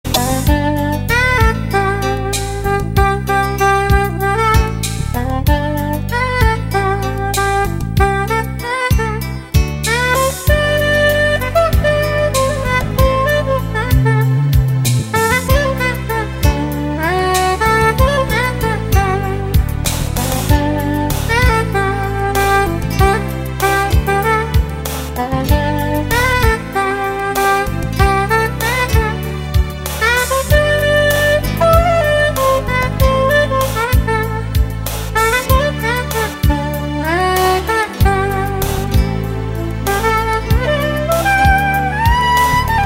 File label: Nice Sax Part